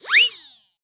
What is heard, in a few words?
Cartoon Whistle Motor Whistle Quick; AdobeCC